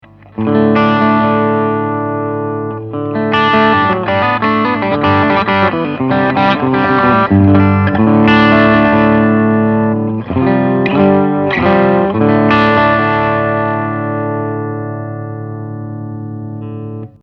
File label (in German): Gain ISF Overdrive off Stegpickup